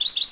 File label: hawks